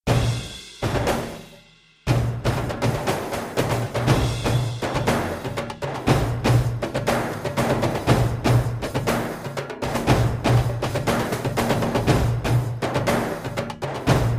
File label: Drummin Backing; EyeToy Play 2